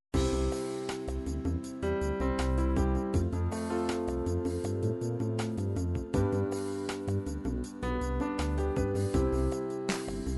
ballads ballad new age, midi

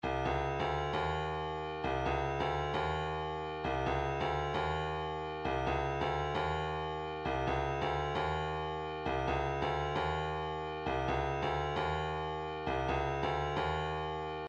133 kick, midi

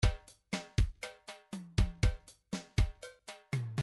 drums latin salsa, midi drums

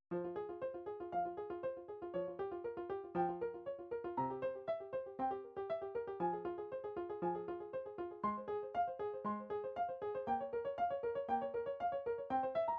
studies for piano, midi

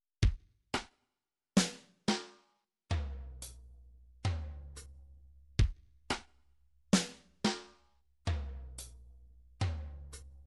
165 tambourine

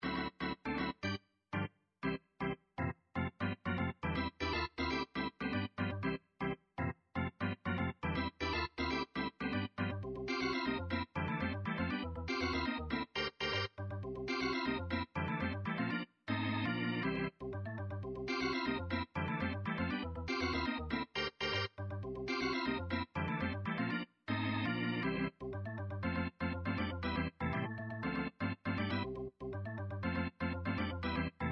title theme organ remix; nintendo 64 super mario 64

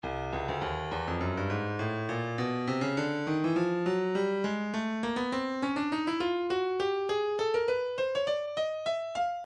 breakbeat midi, 102 bpm; cruz b; Stylus Breakbeat Midi Files

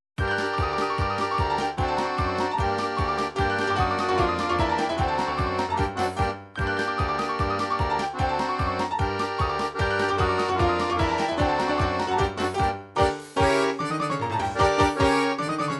folk srbije kolo, midi

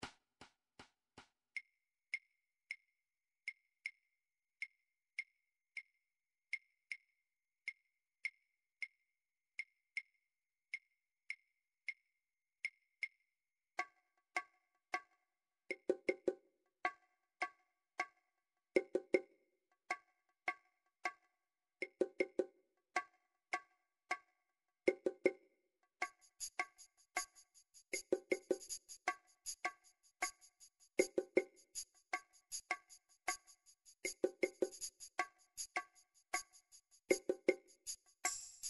percussion, midi drum styles; Midi Drum Styles Toolbox

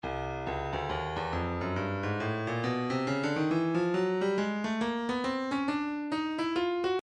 breakbeat midi files 065 069 bpm; Lowly B; Stylus Breakbeat Midi Files

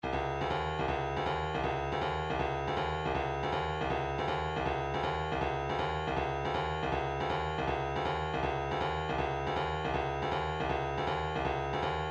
wavedrum, midi

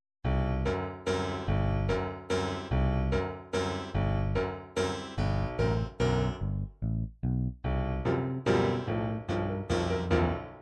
latin vals mariachi, midi

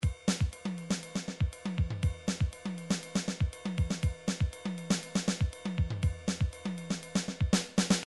midi drums songo

